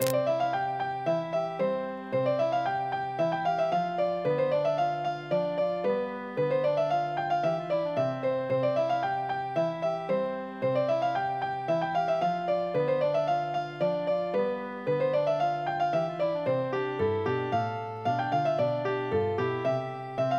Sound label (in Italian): Tutti; vaqui lo mei de mai